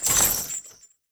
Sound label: Rock Trap Chain; Objects; Ode Lantern Crate